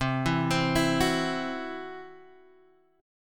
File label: C Major Flat 5th C down Guitar DADGAD